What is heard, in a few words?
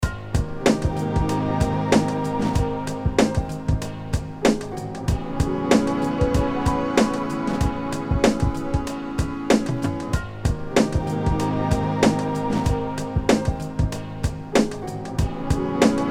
beat